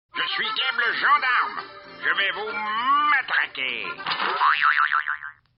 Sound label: gendarme ringtone